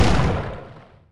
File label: bomb